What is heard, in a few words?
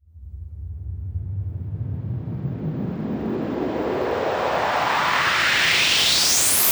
FX Sweep Up